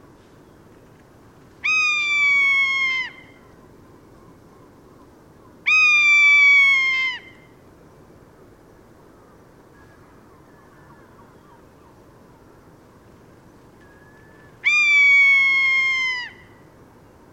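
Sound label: zimnyak golos, rough-legged buzzard voice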